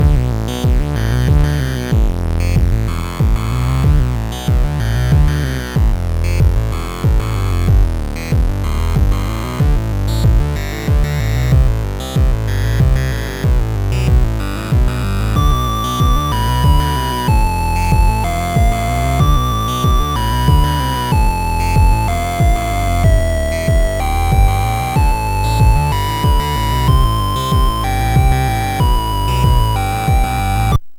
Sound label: locrian; locrian 2; MusicLine